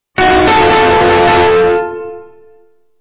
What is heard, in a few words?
midi clavi